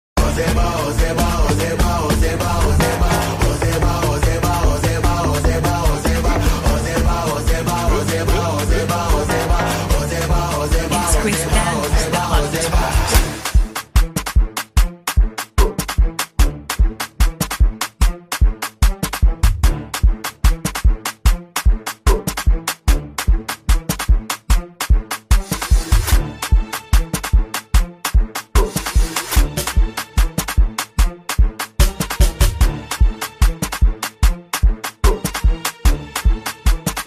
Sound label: Instrumental